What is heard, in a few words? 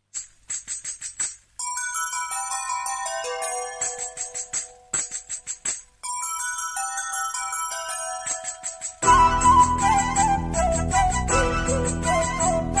Soft Tune